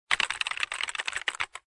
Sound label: Keyboard